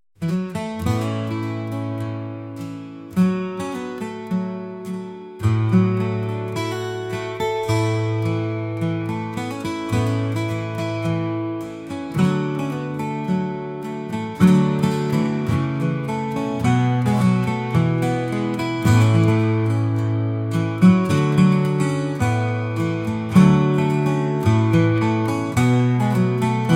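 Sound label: whispers of the prairie, country; kantri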